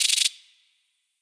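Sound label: ambience beetle; wingsofriches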